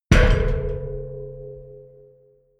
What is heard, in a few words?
massive metal gas bottle low